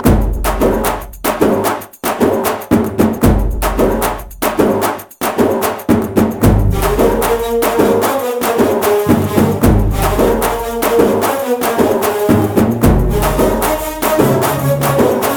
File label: Folk Beats